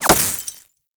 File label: Laser Impact Glass Large; Warframe; Bullet Impacts